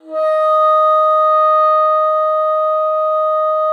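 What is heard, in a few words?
bassoon piano D#3; Xsample VOL-6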